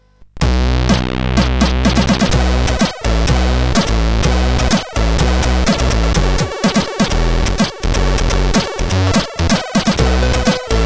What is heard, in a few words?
tune, sid CSG8580R5; Blbla